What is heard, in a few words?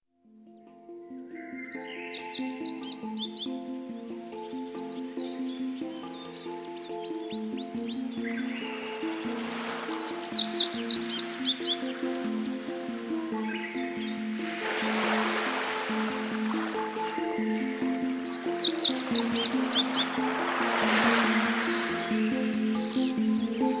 Relaxing Hang Drum, 432